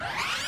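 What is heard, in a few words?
sniper laser power up; allegiance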